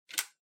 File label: RJ Wall Socket Tel Shutter Close